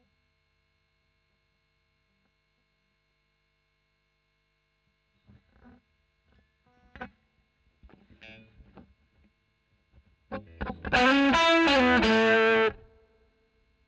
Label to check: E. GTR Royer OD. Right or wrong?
Right